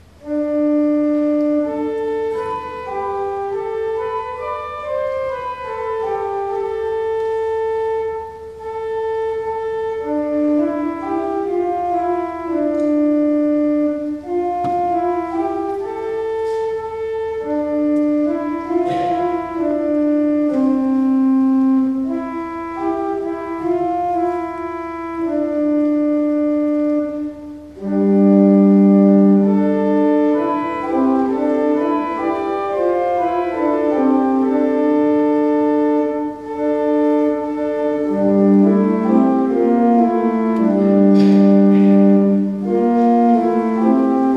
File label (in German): gregorianik